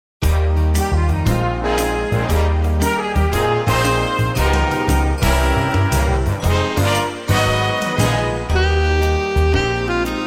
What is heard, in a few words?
music; wise